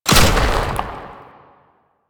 Archgun Charge Full; Warframe; Archwing

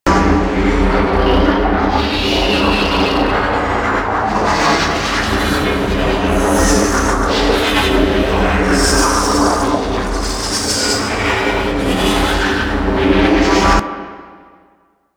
Tether Loop; Warframe; Veilbreaker; Devourer Kahl

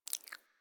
Foley Mouth Move; Warframe; Catbrow

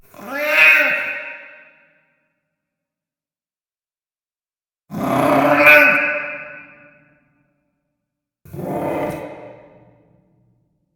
Hug Vocals; Kalymos; Warframe; Nineteen Ninety Nine; Quests; Romance NYE

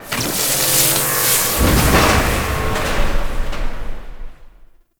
Exterior Floating Metal Scraps Start; Ambience; Props; Warframe; Railjack

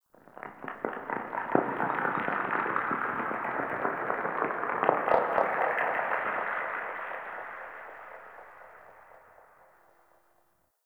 Rail Jack Exterior Earth Crackling; Ambience; Earth Region

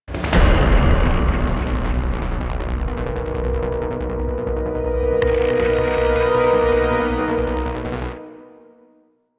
Intro Music; Warframe; Ehra Rok

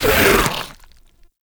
Shoulder Fire Stop; Enemies; Infested; Warframe; Femur

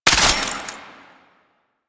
Belt Fed Rifle Pre Fire; Weapons; Warframe; Tenno; Frumentarius

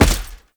Heavy Step Default, Footsteps; Enemies; Foley; Warframe; Tau Dax

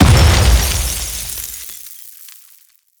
Grenade Explosion; Quests; Veilbreaker; Kahl Abilities

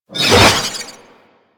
Sword Whoosh; DE; Warframe; Weapons; Sentient; Stalker Sentient Sword

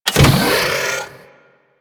Extending Bridge Start; Warframe; Ambience; Corpus Gas City; Gameplay Remaster

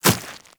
Small Walk Dirt, Footsteps; Enemies; Warframe

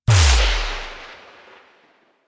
Requiem Mod Install; Warframe; Kuva Lich

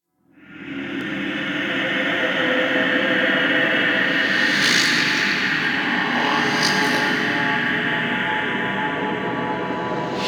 Railjack exterior dark ghost swirl; Ambience; Warframe

